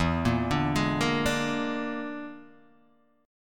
E 7th Flat 5th E down Guitar DADGAD

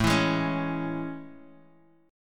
A Major 7th, Guitar Open G; chord

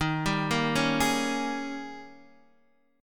Eb 11th Eb, down, Guitar, Open D

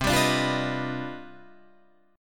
C Minor Major 11th C down Guitar Drop D

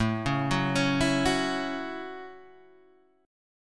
A 6th, Guitar, DADGAD

